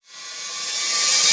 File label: Reverse Cym, Cymbals